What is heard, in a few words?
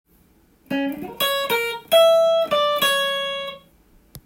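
B minor melody, guitar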